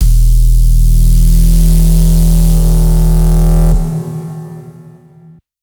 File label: Dist Swell; neuro; BIG5AUDIO